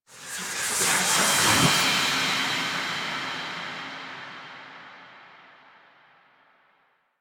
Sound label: Helmet Lift; Apostasy Cinematic